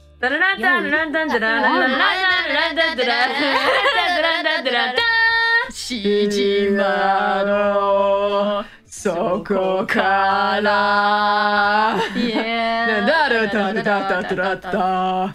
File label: singing